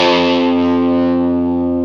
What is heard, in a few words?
GTR Tube, Dirty; Club-50 - Foundations Roland